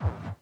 BD Gate FX, Bassdrums; Vengeance Samples

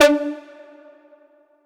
Synth Cuts; Vengeance Samples; VES1 Instruments

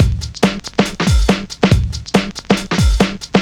Breakbeat 140 BPM

